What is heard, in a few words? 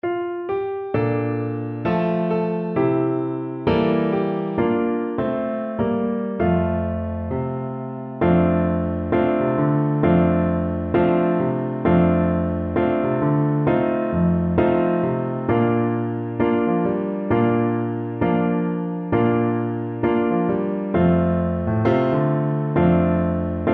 this little light, oboe